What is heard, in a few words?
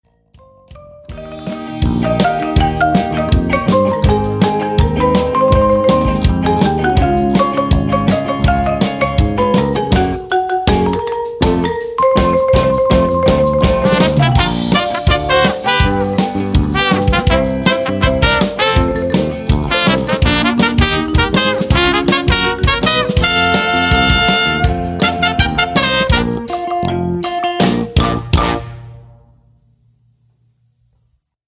brass